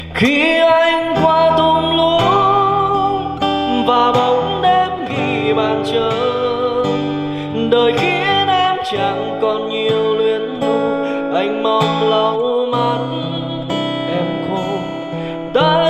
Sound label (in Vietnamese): Nhac Chuong; nhac tre